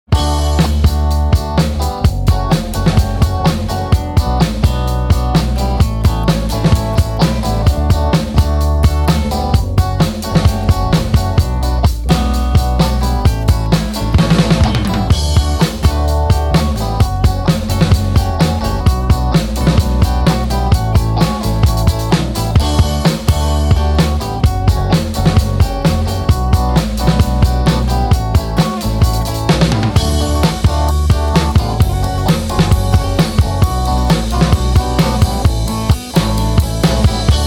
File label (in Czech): vlazna instrumental